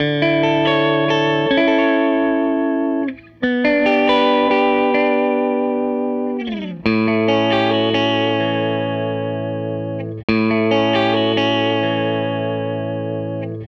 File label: Les Guitar Loop